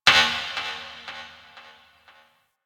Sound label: Ship Energy Knock; Warframe; Corpus Ship Remaster